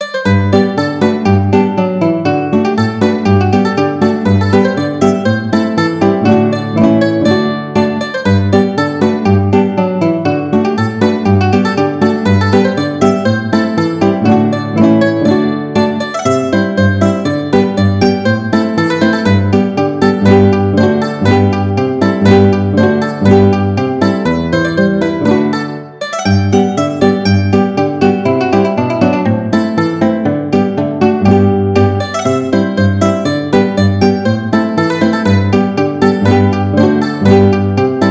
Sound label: Quick March, mandolin guitar duet